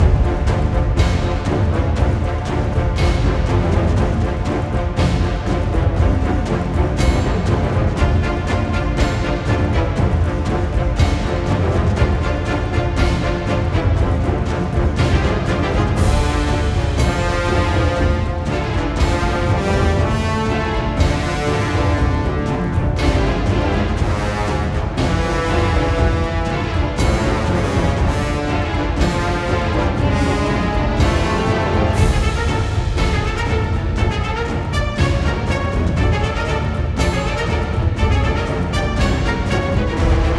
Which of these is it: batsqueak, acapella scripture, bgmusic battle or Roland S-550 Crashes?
bgmusic battle